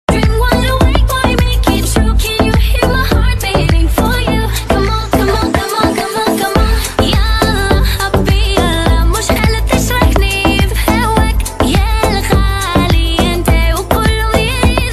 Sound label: arabic mix ringtone